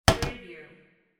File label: glass cup set down